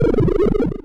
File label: blub; tileworld; boulder dash